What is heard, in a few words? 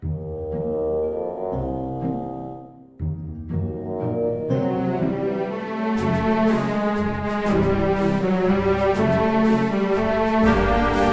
enter initials; DW How to Train Your Dragon